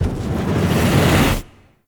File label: Operator Focus Blast Fireball Charge; Warframe; Operators; Attack